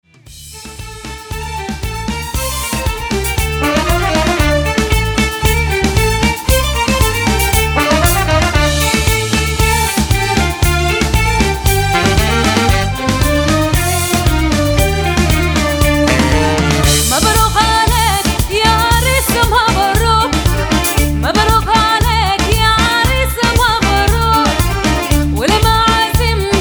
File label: Mabruk Alek Arabic